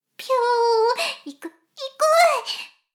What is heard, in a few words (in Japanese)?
しこしこ音声; 牧場戦腺; 少佐殿